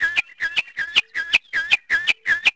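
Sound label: ambience bird; Tony Hawk's Project 8; XBOX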